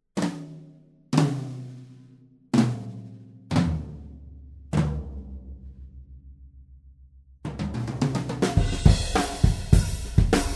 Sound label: Dunnett Ti Drumset